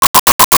Arcade Chirp; Arcade and Games

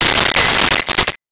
Broken Wine Glass, Crashes

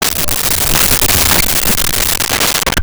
Space Ship Fly By, Science Fiction

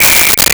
Referee Whistle; Sports & Recreation; SONY PICTURES SOUND EFFECTS SERIES

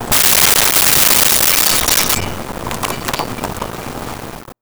Bottle Break; Impacts; SONY PICTURES SOUND EFFECTS SERIES

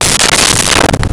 Dull Wood Hit Bounce; Vintage Cartoon; Drums & Percussion

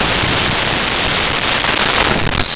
Elephant Gun

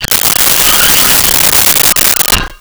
Radio Hit Digital Bull Horn; Transitional Elements; 1001 Sound Effects

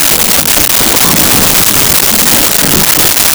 FM Radio Tune; Communications; 1001 Sound Effects

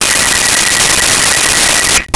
Telephone Ring; Communications

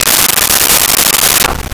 LoFi Synthetic Squawk; Vintage Cartoon; Comedy Vocals; SONY PICTURES SOUND EFFECTS SERIES

